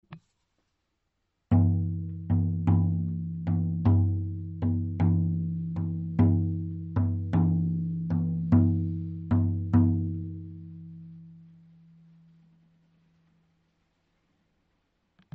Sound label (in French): Tambour moucheté